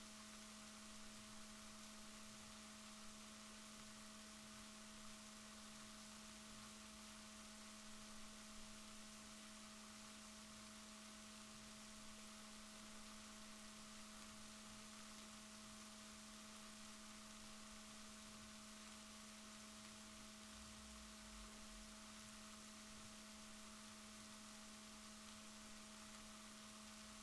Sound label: rorquals positif, Balaenoptera Physalus Chile